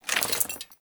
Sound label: Generic Foley; Warframe; Dagath